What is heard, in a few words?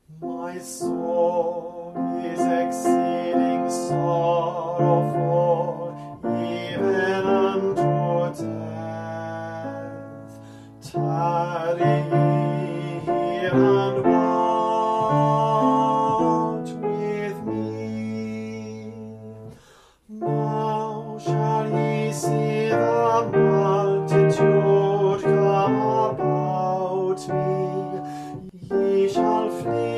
soprano